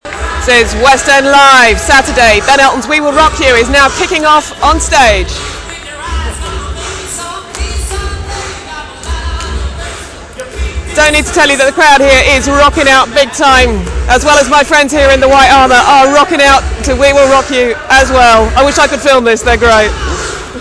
rock

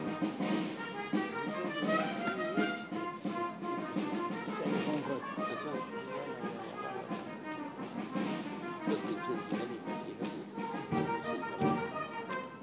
sea song set

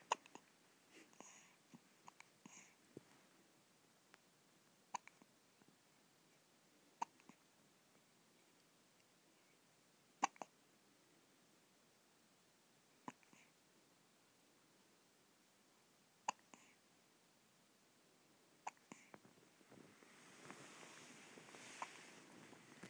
hiccups

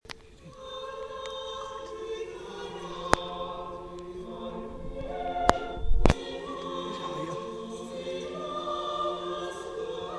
graduation choir

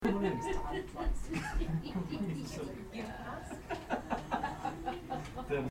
laughing at the trumpet inn ledbury

